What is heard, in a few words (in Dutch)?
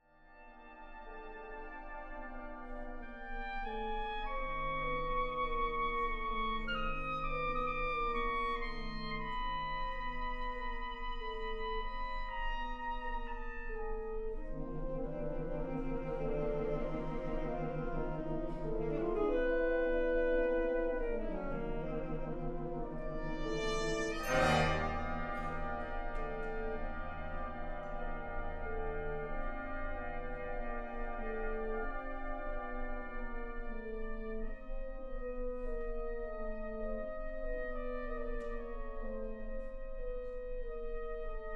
Concert voor Sopraan Sax en Fanfare